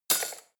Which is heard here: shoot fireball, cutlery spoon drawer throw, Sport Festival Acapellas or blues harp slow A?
cutlery spoon drawer throw